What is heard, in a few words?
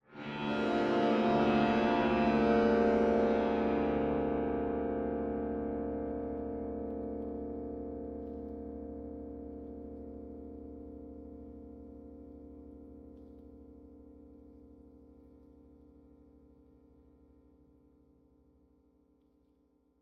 drone, electric, DI, strong, hopeful, temperamental; Cuba; Eiravaein Sound; Yvaine